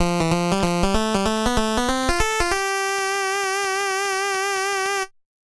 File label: BGM 8 bit melody run; Vostok Inc.